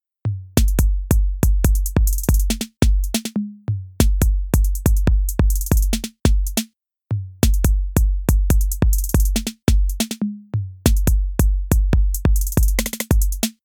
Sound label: Beat Dn